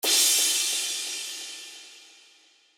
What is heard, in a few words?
crash; FL Studio